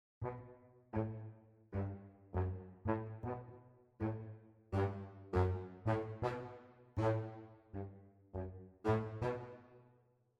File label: Swing Low Ensemble